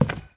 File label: Wood footstep; starbites